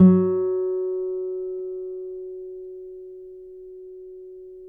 NYL F#, FLAMENCO NYL; Spectrasonics; Hans Zimmer Guitars VOL-2

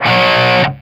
C 4, Soldano rock guitar

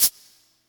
Cabasa, Yamaha PSR E305

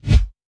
kick, big rooms

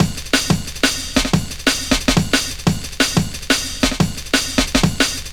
Dope Break, Drum & Bass; Fluid Dynamics

